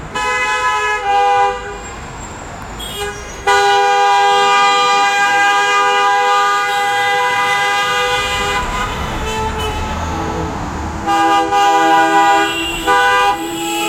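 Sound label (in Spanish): ruido de colapso de tráfico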